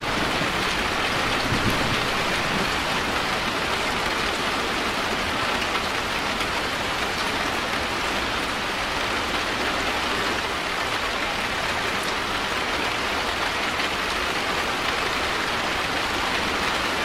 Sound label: Cozy Bedroom Rain Thunder Sounds